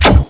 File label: gauss fire